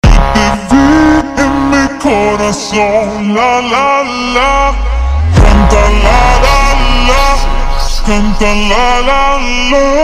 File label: Dark super slowed phonk, drift phonk